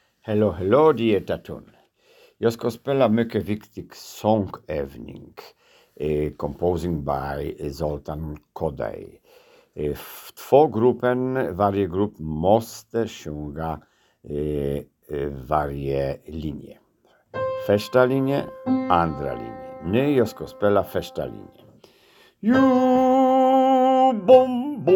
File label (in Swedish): Sångövning SATB